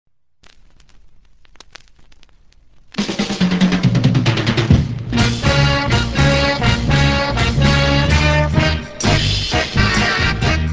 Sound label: organ lets go